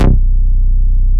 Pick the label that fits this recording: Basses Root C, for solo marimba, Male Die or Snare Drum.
Basses Root C